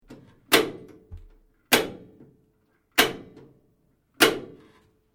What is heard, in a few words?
SHOWER SYSTEM JAPANESE IGNITE SWITCH, Japanese bathroom appliance; Household